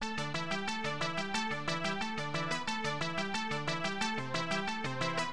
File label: SPLATT, HipHop, Keyboards; LiveAct Pool 1